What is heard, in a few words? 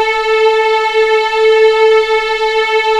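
A4 legato F L, string; East West; The Ultimate String Collection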